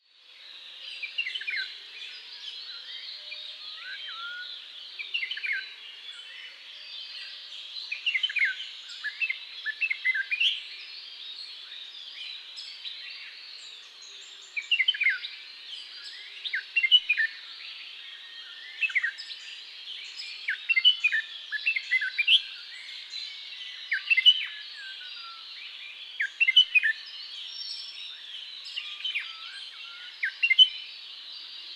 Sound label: Red whiskered Bulbul Pycnonotus jocosus